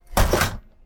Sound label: locker close